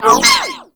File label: Corpus Energy Projectile By